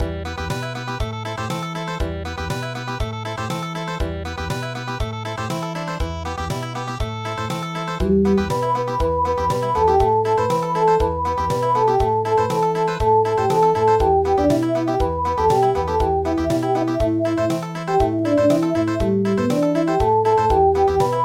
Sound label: Country